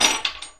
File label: Metal Drop